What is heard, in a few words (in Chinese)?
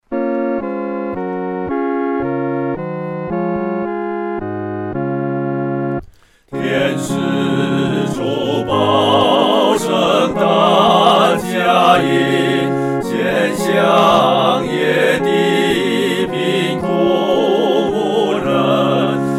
合唱 四声部; 天使初报圣诞佳音